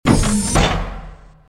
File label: Door Open Close; Redmatch 2